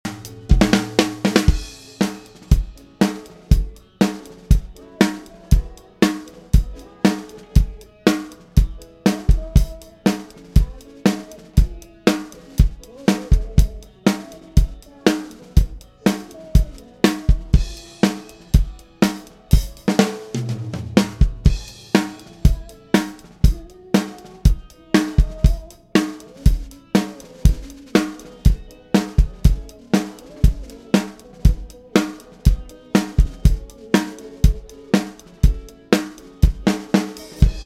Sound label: drum